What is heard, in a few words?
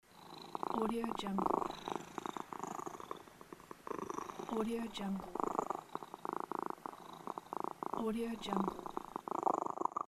cat purring loop; n-audio